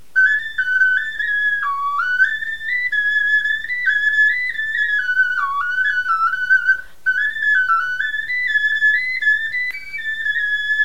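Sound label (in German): melodie, Knochenflöten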